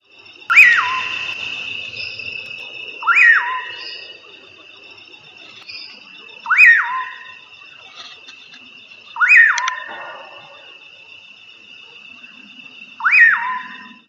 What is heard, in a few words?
Nyctidromus albicollis; Green Valley; S Roque SP